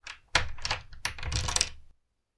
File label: key in lock sound